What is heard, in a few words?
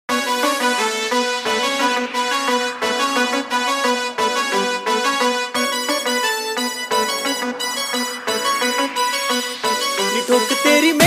male version